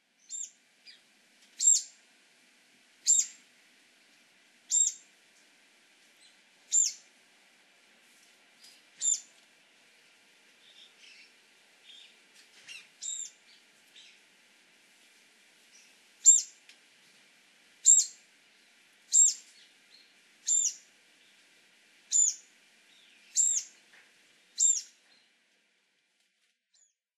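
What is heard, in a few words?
Brown-eared Bulbul, kokubunji; hiyodori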